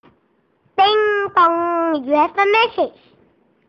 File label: Little Girl Sms